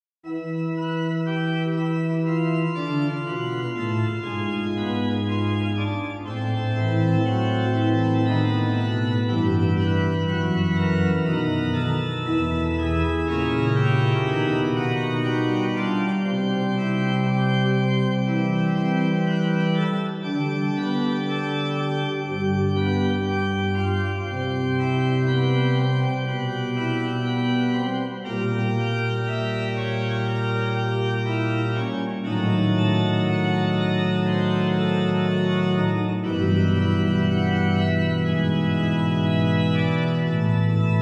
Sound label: Kyrie Plein Jeu, Organ Music, Souvigny; Beauvarlet Charpentier Journal